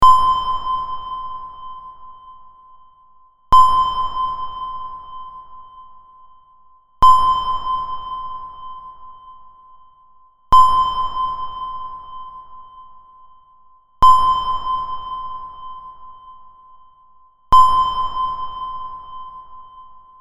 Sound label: buzz answer sonar